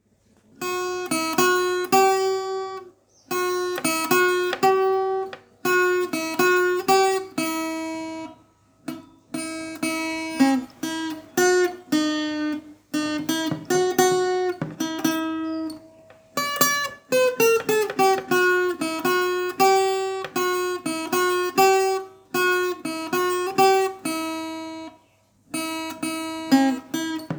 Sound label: Guitar